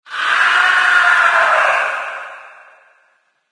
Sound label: speaker scream; Source Engine; de deadblock